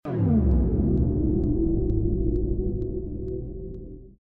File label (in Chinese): 玩家死亡